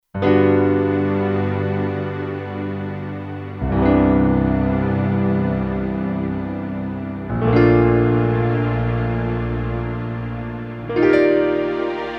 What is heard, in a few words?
Brt Grand & Str, Kurzweil K2500X; Factory Programs